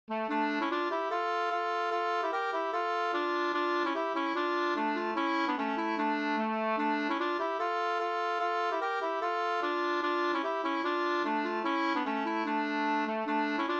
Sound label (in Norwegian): reinlender